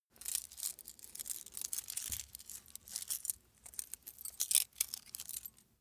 small metal handling jingle long

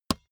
basketball dribble tap hard; sport freizeit

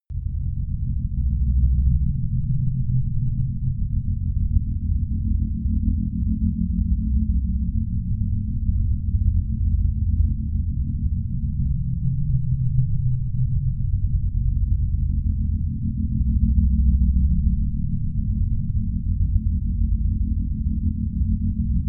drone deep tone pulsating

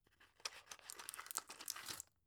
drug pull out soft; berufe; arzt

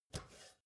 step ladder metal grip single; schritte

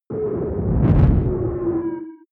spaceship engine slow down; raumschiff

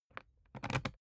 briefcase plastic latch lock key insert; industrie wirtschaft; bueroarbeit

